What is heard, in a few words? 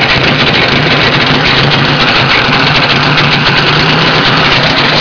old car engine